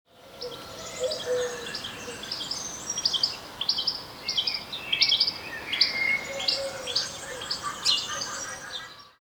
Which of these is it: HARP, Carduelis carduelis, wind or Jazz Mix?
Carduelis carduelis